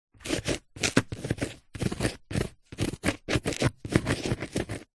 office pen biro ball point write on cardboard piece